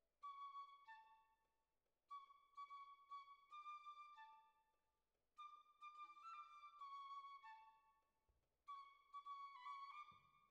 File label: Violins Over